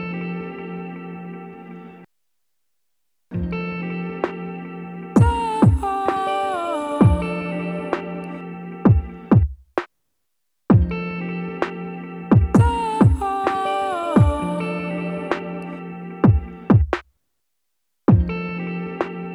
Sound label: pipe; skate